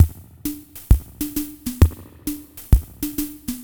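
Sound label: Machine Swing 132, Lounge Drumz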